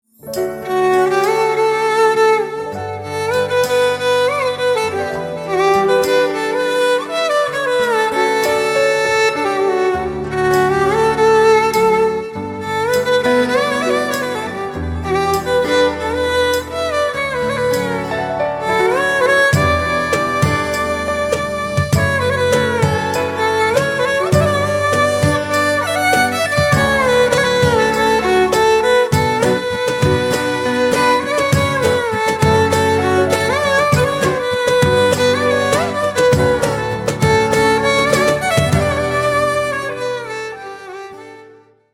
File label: Violin